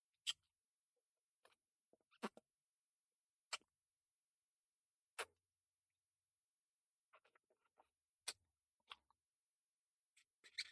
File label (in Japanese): 乳首舐め, ASMR